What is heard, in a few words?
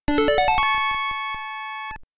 Magical Item Acquired; konami famicom chronicle vol.3 rom cassette edition